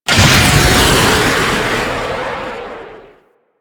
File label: Corpus Powerplant Jettison Drop; Ambience; Warframe; Corpus Railjack; Tether Power Plant